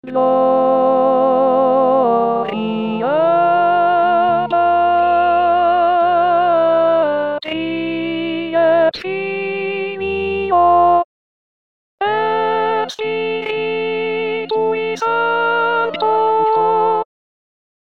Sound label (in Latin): Laudate Dominum solo coro contralti; voci guida; Mozart; corali in vaticano 2024